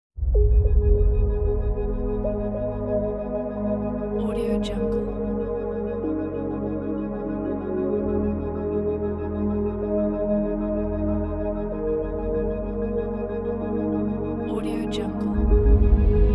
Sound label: epic elevation